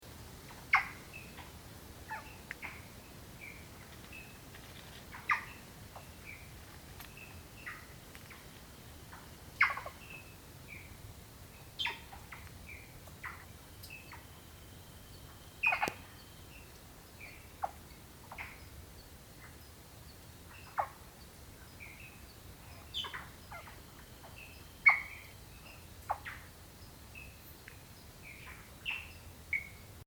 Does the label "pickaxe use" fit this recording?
No